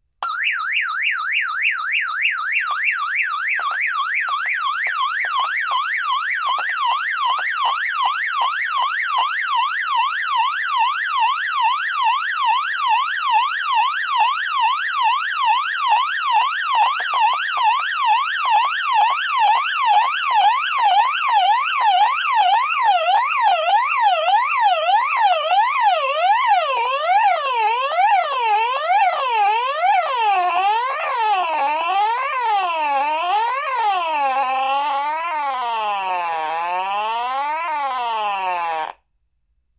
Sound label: Alarm Car Type 4, car alarms